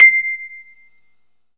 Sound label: Rhodes fast, 3D Keys; 3D Sound; 3D Keys VOL-1